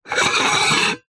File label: household pot lid clay or ceramic lid turn rotate spin on pot